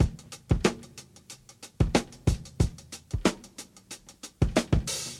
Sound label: Plane Jane Beat